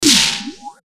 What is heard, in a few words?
Hit Crash Flange, Hit Crash Flange Metallic Ring